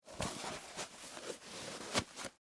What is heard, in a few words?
Tennis Shoe On, Foley, Tennis, Shoe, Put, On; Shoes; Objects; BlastWave